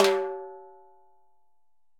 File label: Timbales A H Flam, Latin Percussion, Drum Hits; Ableton; Factory Packs